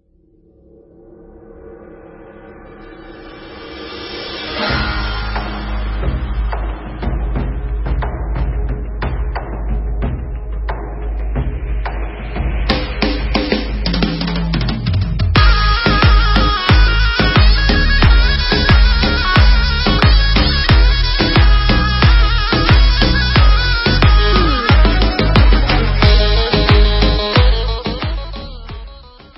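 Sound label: Instrumental, Israeli Dances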